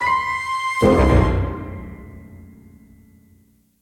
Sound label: Death; king s quest chapter 1 a knight to remember gamerip